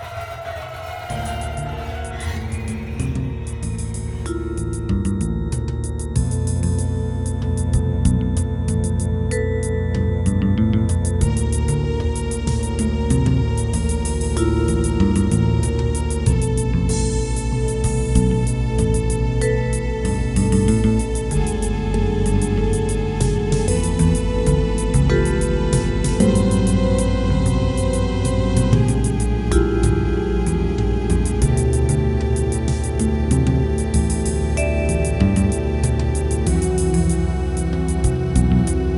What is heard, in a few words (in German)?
Filmmusik